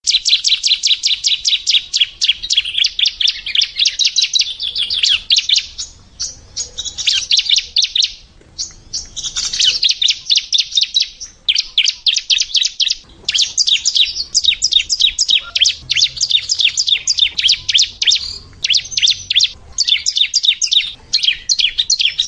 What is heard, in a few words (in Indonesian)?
ciblek